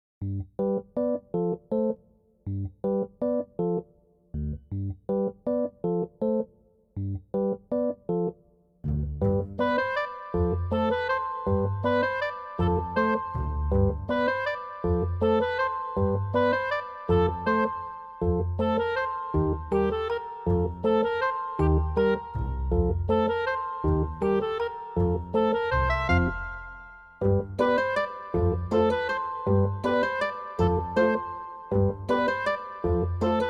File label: Comic Clarinet; Enemies from the Past; Korea 2017 OST